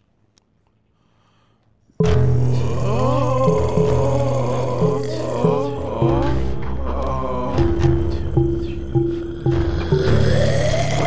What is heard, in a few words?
steel drum band